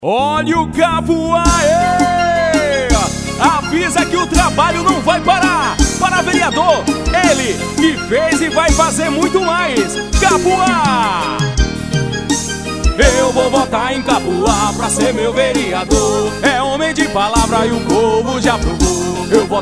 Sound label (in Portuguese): politica music